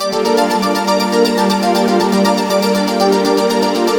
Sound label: (arp) single chord; Sonic Foundry (Sony Creative Software); Ugly Remnants VOL-2